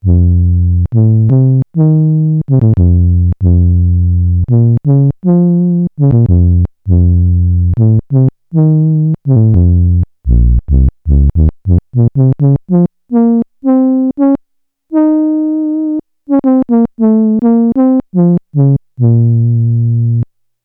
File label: HORN, Roland SH-101; Factory Patches